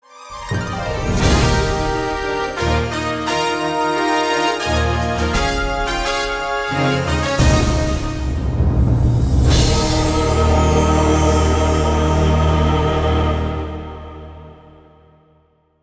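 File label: fanfare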